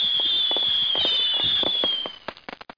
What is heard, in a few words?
firework; Professor Multimedia